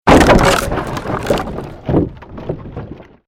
created wooden structure crash medium; Series of dramatic events based on wooden smashes and collapses; Crash Sound Effects

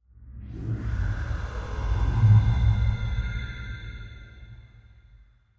Sci Fi Spaceship; Spaceship Landing; Spacecraft

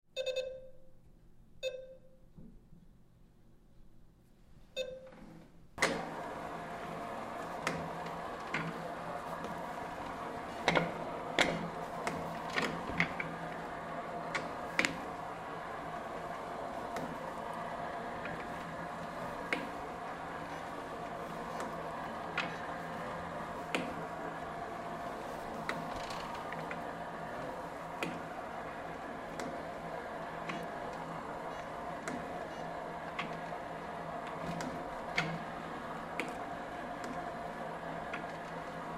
Stair Master Cycle, Stair Master Cycle Treadmill; Miscellaneous Sports; Blastwave

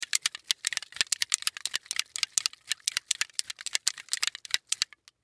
Silly String Shake, Silly String Shake Can; Silly String Shake Can Long; Shaking; Foley

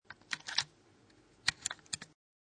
Foley Handling Small Plastic Parts; Clicks; Objects

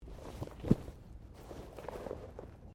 Body movement Clothing Foley

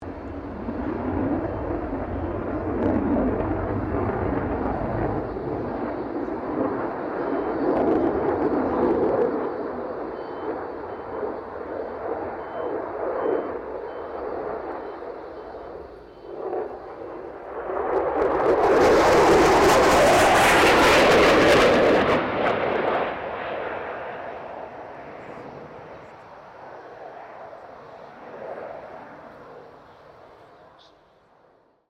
AIRCRAFT FA-18 HORNET PASS; RAAF FA-18 Hornet; Airplanes; Sound Effects:Vehicles

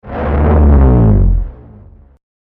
Too Close To The Beast, monster sound effect; fantasy sound effect; role playing games, magic videogames

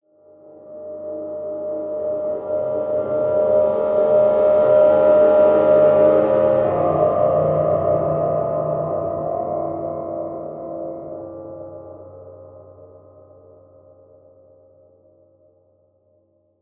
Reverse Piano Whoosh; Scifi Production Elements; Science Fiction Sound Effects

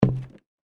Snowball Hits Window; Snow Sound Effects

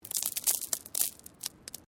Stone Small Move; Material components for constructing more complex sounds; Rocks; Sound Effects:Materials

